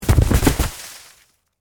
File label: Object Roll Snow, Weather, Object, Roll, In, Snow